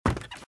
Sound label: Footsteps - Wood - Creaky - Run - Left